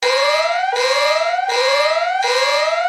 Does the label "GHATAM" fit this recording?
No